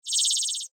Beep Electronic, Scifi, Beep, Electronic, Science, Fiction; Chime; Tech Sound Effects; Blast Wave